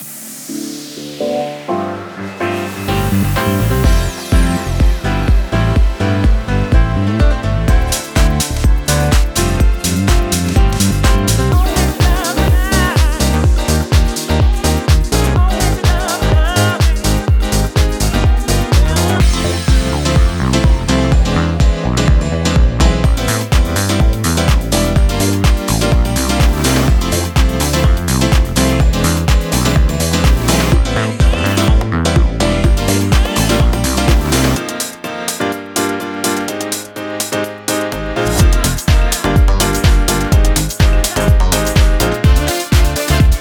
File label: Magic Piano House